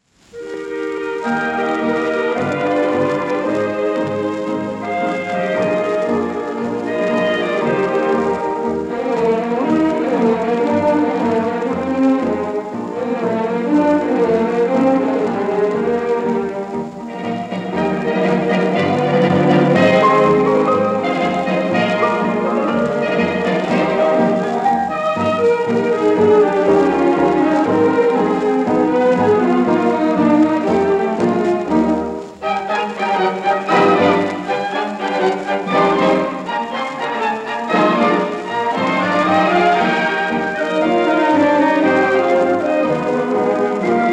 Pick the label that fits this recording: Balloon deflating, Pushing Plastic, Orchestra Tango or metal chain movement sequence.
Orchestra Tango